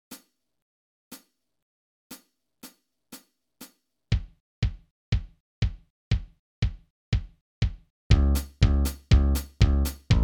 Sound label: Backing Track 120 bpm